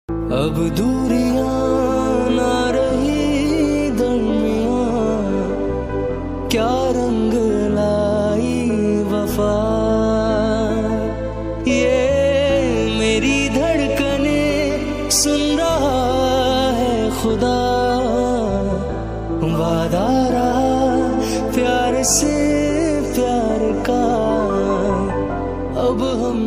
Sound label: Romantic Hindi Ringtone